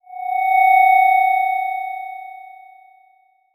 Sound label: Transition Bell Swell; Production Element Title Transition